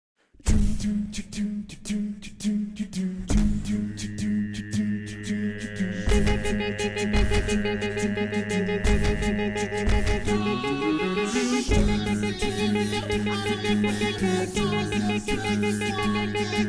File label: Tenor Left